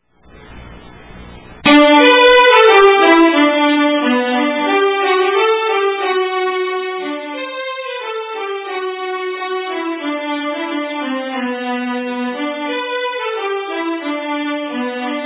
Snoa

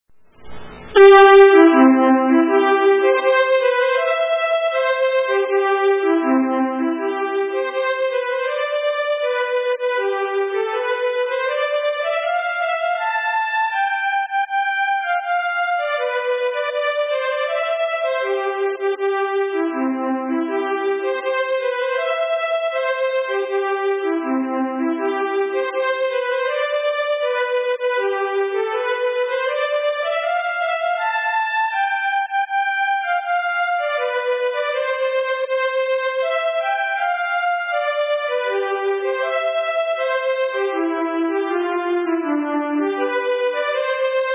Polska

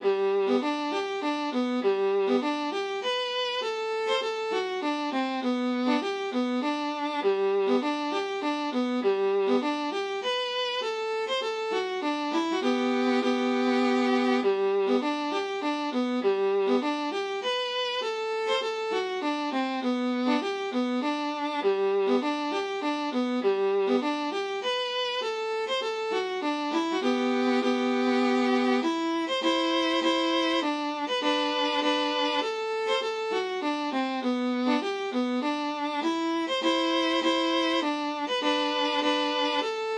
hambopolska

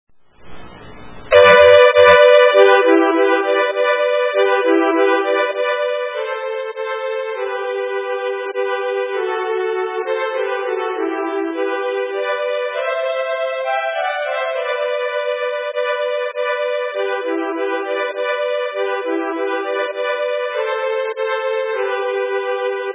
vals

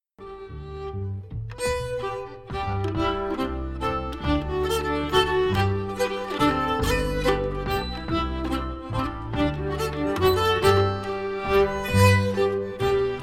Mazurka G

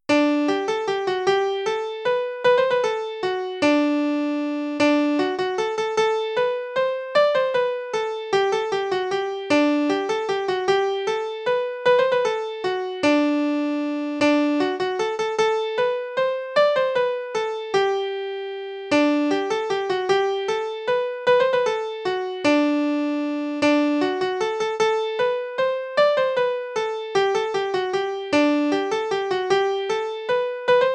vals; Roetta Larsa

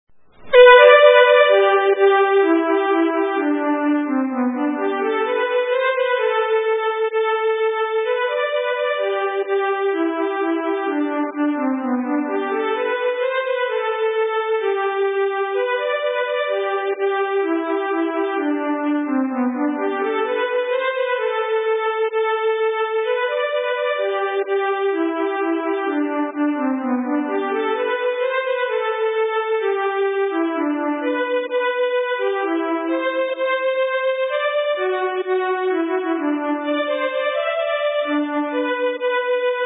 Schottis; Dwigth Lamb

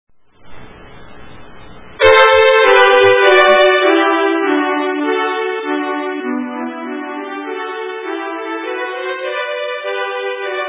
treppevals

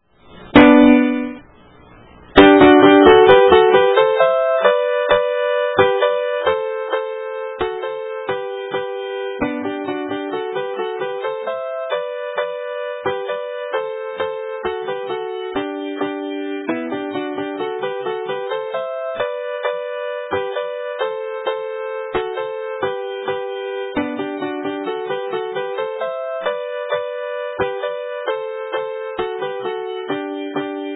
Schottis; fr Skoelvene

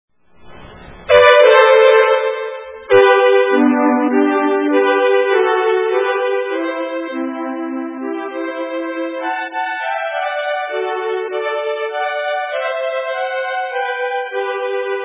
Valsen; Malaa

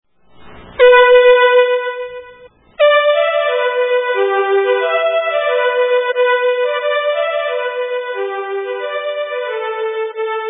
polskan

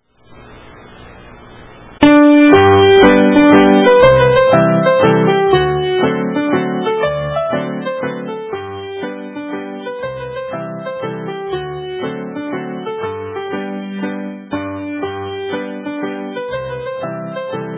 Tierpspolskan

